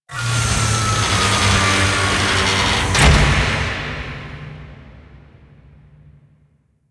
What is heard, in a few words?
Grineer Ship Extraction Ship Close Long; Liset; Tenno